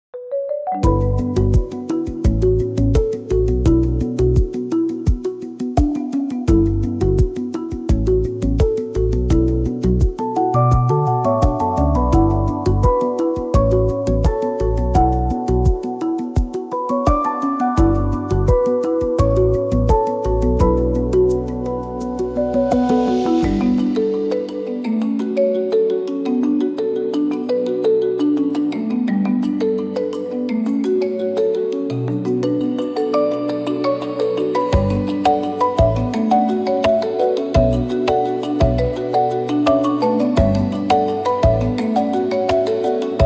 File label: Subtle Signs active, percussion